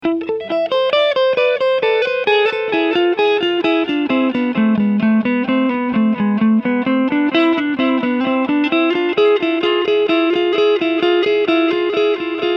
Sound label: Tape Variation